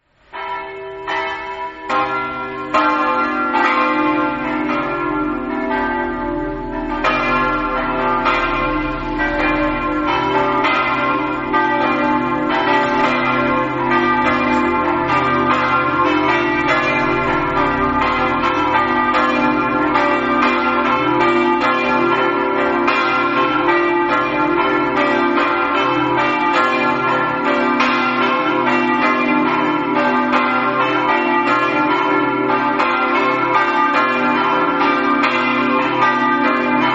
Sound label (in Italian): campane; premar